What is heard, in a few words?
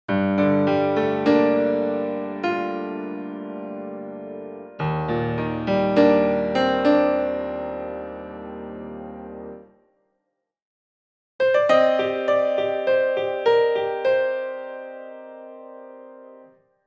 Piano Wood Room